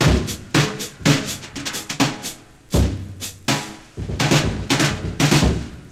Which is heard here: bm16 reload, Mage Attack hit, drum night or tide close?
drum night